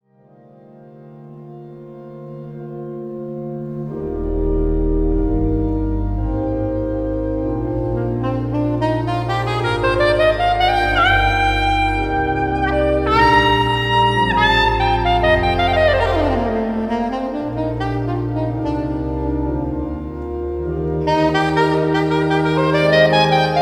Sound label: Adagio Oboe Sonata in c; buxtehude